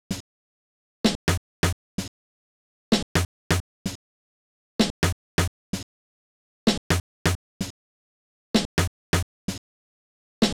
Drum Loop 128 BPM Percussion, House; Loop Stems; Dogs

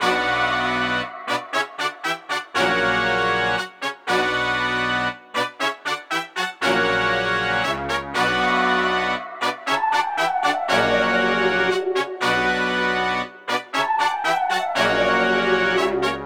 118 BPM B Min, Melody, Trap; Cymatics; 2022 Melody Collection; Trap Pt 1

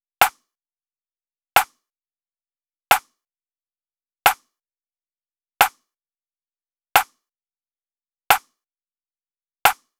Drum Loop 89 BPM Rim; Loop Stems; Cymatics; Vibes II Drum Loop Collection; Thinking

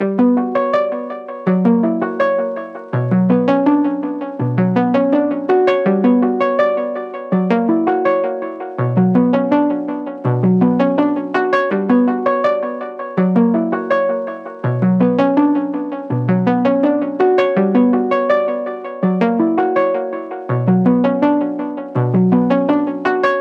164 BPM B Min Moog One Arp; Cymatics; Horoscopes

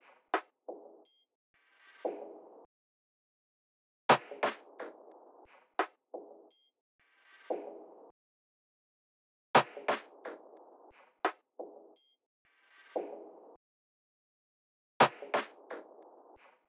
Drum Loop 88 BPM Percussion; Drum Loops; Loop Stems; Cymatics; Mystery Pack Vol 4; Patience Drum Loop

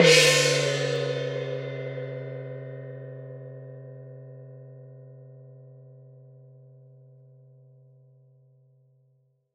Gong Large; World Percussion; Cymatics; Emperor World Percussion

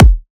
Riddim Kick; One-Shots; Ghosthack; Riddim Essentials Volume 2

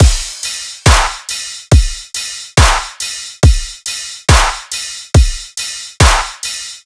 Drum Full 140BPM, dubstep; Ghosthack; AC2024 - Day 13 - Dubstep Sounds 2025

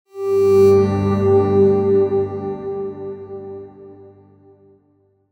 Tone B

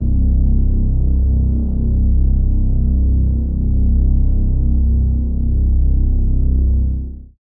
REESE (C); Basses; Toronto; Cymatics; Orchid Sample Pack; 808s & Basses

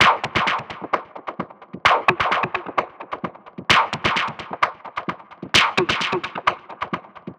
Perc Loop 130, techno; Loops; Percussion; GhostHack; Ghosthack - Free Techno Sounds 2024

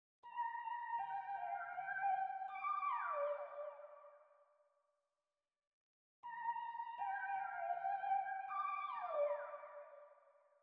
160 BPM G Min Warped Sine; RnB; Loop Stems; Cymatics; Aroma; 2022 Melody Collection; RnB Pt 1

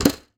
Maple Brush Flam, Snares; Drums; Ghosthack - Free Snares 2023

